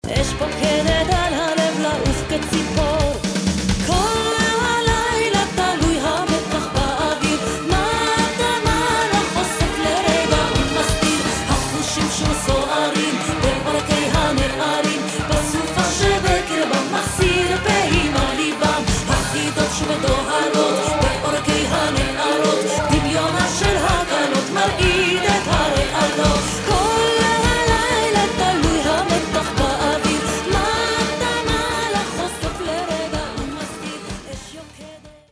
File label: bonfire Debka